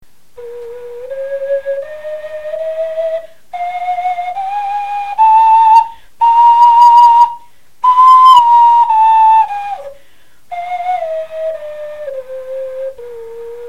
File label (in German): tonleiter, knochenfloeten